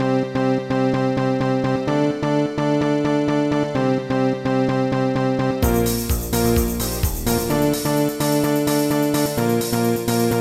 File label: tecno